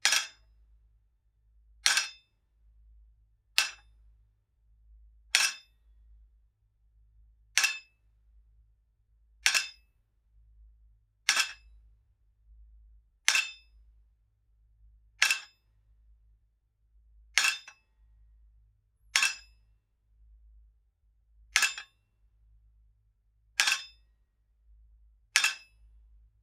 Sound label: Lifting Weights Multiple; Fairlight Sound Lib